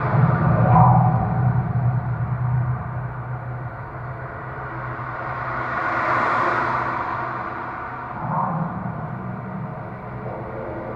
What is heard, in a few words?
Beam Barrier Exp Gap, Around Bridges; Detunized